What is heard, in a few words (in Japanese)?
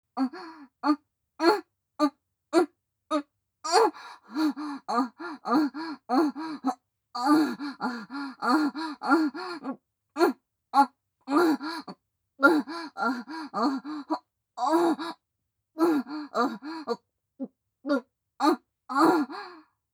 オホ声 バック